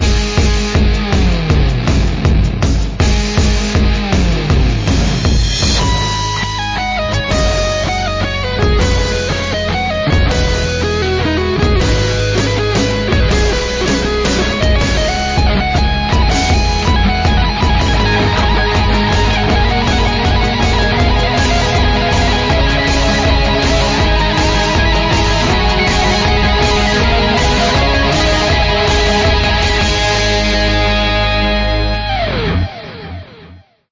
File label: Motivational